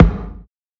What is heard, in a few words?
Tom G#; samples